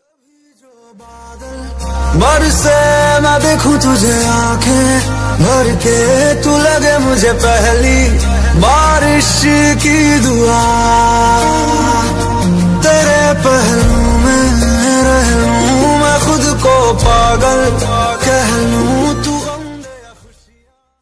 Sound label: bollywood sad song ringtone